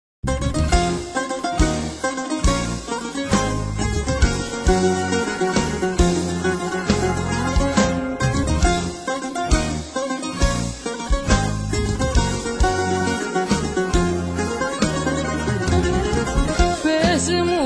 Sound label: Zeibekiko; Adults Open Solo; Adults: 16 – 59 yrs; Rizoelia Banquet Hall